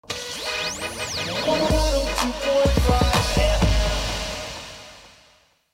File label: Radio Car Hiphop Jingle; gangstar rio city of saints